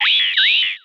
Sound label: lava mining attack light